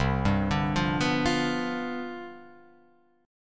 C Major 7th Suspended 2nd Suspended 4th C down Guitar Open F, chord; CM7sus2sus4 arpeggiated; JGuitar